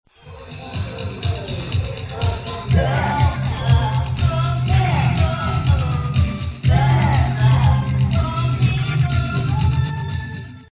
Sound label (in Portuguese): jingle; coreografico; danca comigo da tv